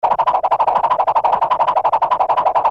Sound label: Swinging